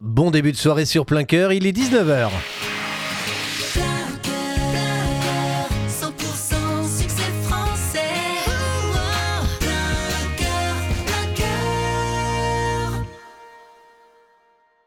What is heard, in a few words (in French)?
TOP HORAIRE NEUTRE; PLEIN COEUR; MOULINS